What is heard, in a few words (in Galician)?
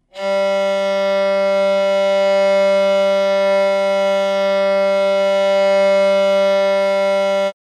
tercant